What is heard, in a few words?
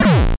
gabber 1bit kick; Kicks; single hits